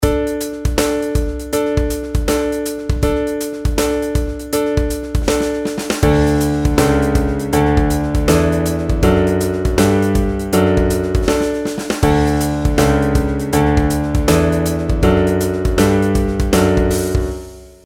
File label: pitch patterns stepwise